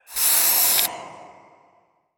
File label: spray